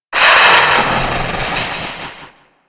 Generic Death, Vocals; Warframe; Orokin

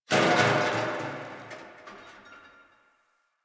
Garbage Can Rattle; Warframe; Ambience; Nineteen Ninety Nine; DE

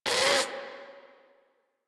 Combat Vocal Pain; Enemies; Warframe; Catbrow

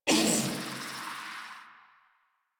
Pain, Barks, Battle; Warframe; Zealoid Prelate

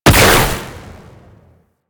Amalgam Moa Satyr Weapon Fire; Warframe; Weapons

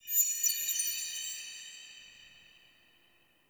Day Texture One, Ambience; Glades Of Andurin

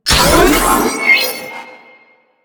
Activate Console Symbol; Venus Kahl; Warframe; Quests; Veilbreaker

